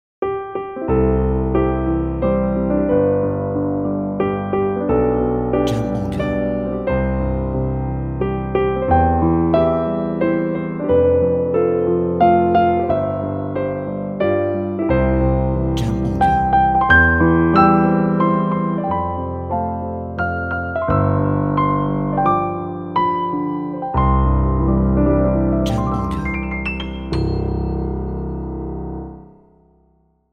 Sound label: Happy Birthday to You